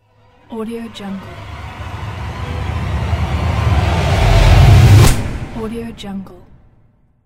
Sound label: hell rise